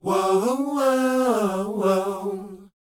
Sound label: WHOA G; Spectrasonics; Vocal Planet; R&B Dance; WHOA OO